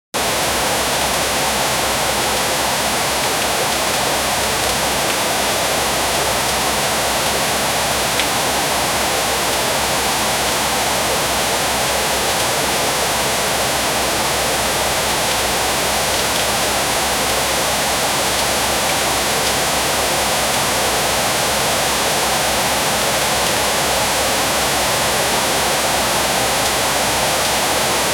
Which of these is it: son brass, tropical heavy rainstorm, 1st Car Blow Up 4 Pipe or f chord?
tropical heavy rainstorm